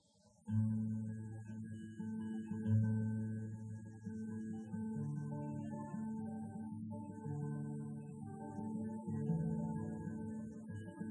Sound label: guitar open mic live